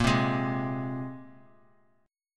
A sharp 7th Suspended 2nd down; strummed; JGuitar

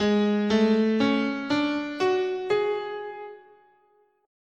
Ab Iwato, scale

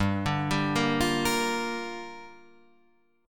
Bb 6th G

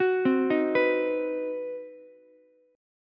C Minor Major 7th Flat 5th chord, Ukulele; arpeggiated; JGuitar

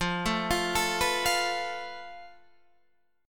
F Major Flat 5th

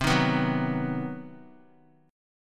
C Minor Major 7th Flat 5th chord, Guitar

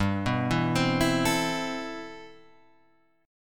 C Major 13th G

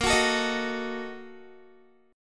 Bb Major 7th Suspended 4th Sharp 5th, Guitar; strummed; 18,21,19,20,18,x; 4E,4A,5D,5G,5C,6F; BbM7sus4#5

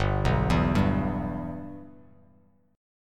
G 6th; G6 arpeggiated

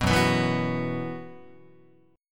Gb 7th Suspended 4th Gb down Guitar, chord; Gb7sus4 strummed

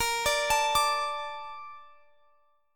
A sharp Major 7th, Guitar Standard; x,x,20,19,22,22; arpeggiated; capo=9

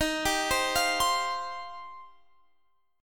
Eb 6th Add 9th Eb, Guitar Standard; arpeggiated; x,18,17,17,18,20

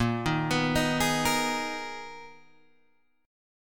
B Major 13th Bb, guitar

